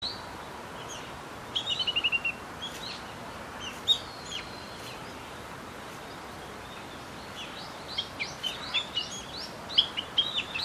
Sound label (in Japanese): uguisu